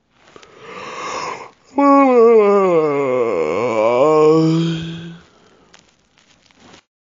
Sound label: yawn